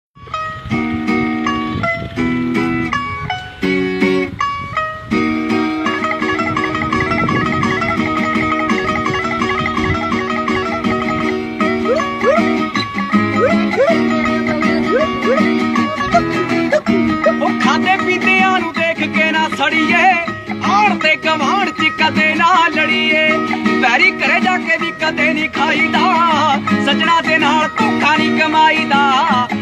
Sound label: Tumbi And Guitar